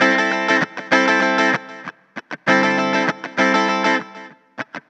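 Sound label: guitar, Roots Funk; USB Soundscan vol.41; AKAI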